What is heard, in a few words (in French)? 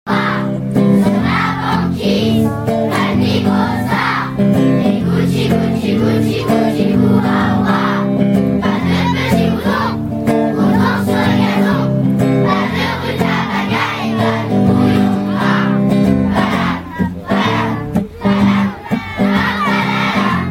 Chorale; Chorale de l'école d'Eléa